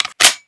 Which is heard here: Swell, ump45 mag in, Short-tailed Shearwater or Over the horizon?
ump45 mag in